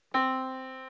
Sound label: Yamaha Disk, piano sound